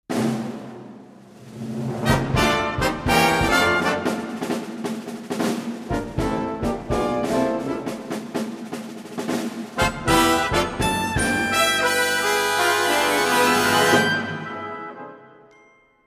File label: brass